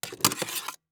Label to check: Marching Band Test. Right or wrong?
Wrong